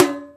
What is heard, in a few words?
Can hit, Foley; SFX; 7rystan's 600+ FREE EDM SAMPLES & DRUMS